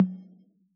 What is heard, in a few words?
analog conga; Yamaha DJX; AnlgKit1